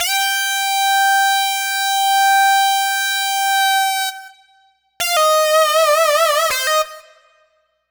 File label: Lead Synth Lick; Dr. Fink's Funk Factory